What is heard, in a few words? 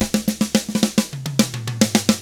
SMBA, SAMBA; ILIO; Double Platinum Drums 1